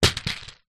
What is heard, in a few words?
seed pod flamboyant tree drop on floor inside; foley